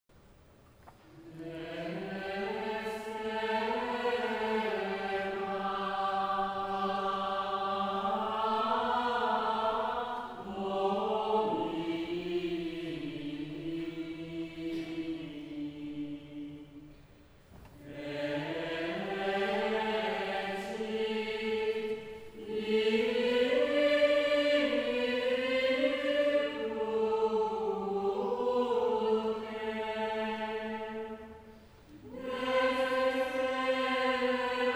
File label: Dextera Domini Gregorian Chant